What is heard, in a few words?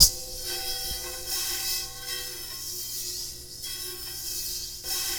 BRUSH BOWL, PERC METALIC; Rarefaction; Poke in The Ear With A Sharp Stick